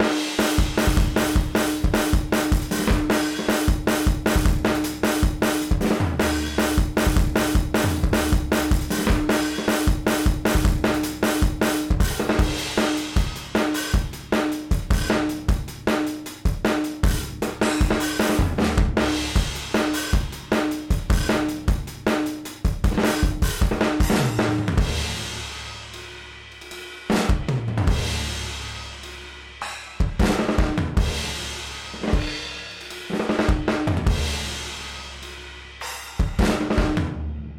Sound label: GARAGE ROCK DRUMS DRUM TRACK ONLY